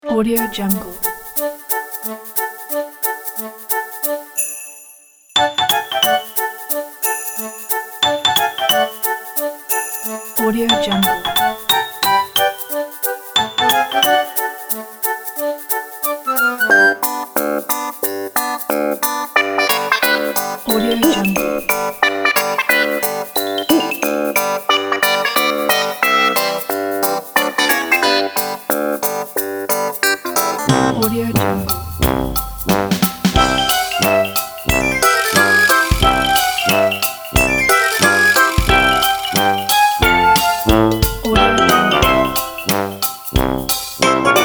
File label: funny game